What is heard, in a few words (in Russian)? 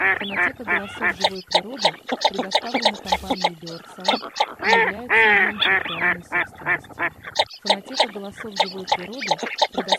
Широконоска; MESTOLONE